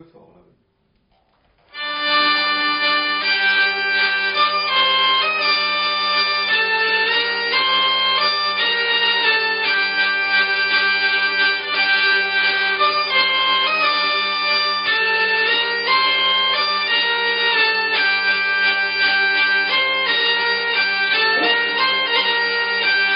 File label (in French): valse mineur rythme